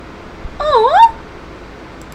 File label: aww; gamma v